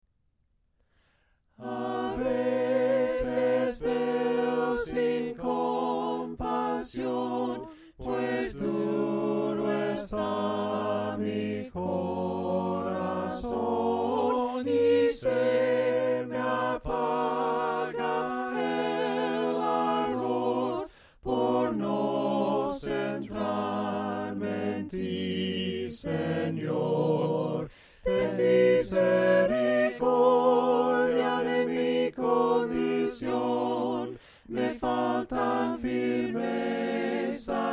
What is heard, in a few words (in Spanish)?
himnos a capella; cantos del camino